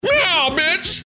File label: meow audio